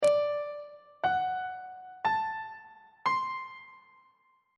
e aeolian 7th chord 7 is d dominant 7th on treble clef